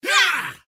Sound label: attack; Mad Mage; Mob Voice